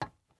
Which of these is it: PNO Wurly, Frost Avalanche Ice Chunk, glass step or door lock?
glass step